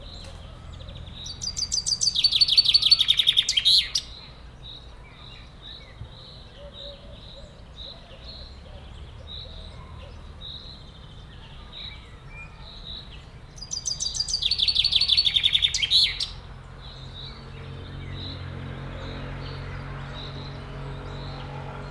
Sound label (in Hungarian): szabadon lévő madár, erdei pinty; jaszberenyi zoo 2016